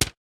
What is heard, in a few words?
Toaster; gamerip; Rabbids Multiverse